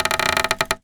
Polystyrene Creak

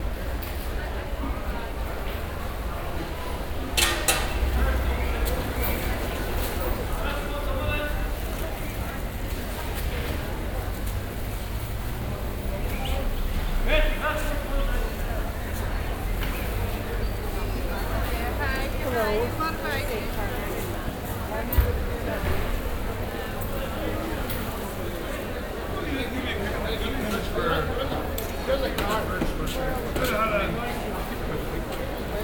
St Georges Market, Belfast; Sound Scapes

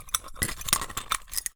Cowbell Chain, Percussion

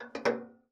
Ping, Kitchen Toaster

